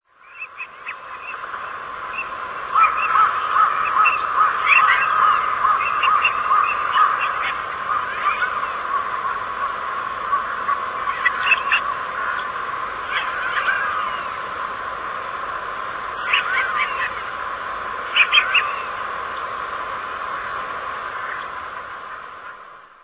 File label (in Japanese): hosihajiro, singing